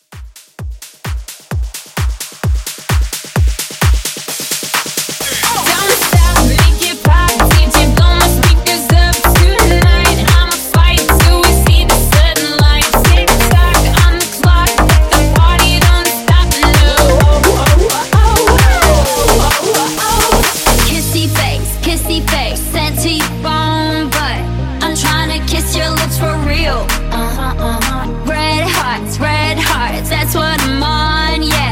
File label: House 130; Mixshow Edit